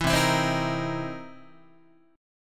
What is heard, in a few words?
C 7th Sharp 9th C Guitar; strummed; JGuitar